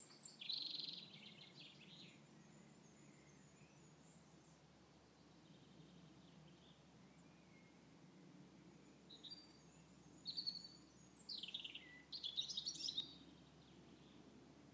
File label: birds chirruping at eastwood park